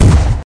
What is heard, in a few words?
fish fire; frogfish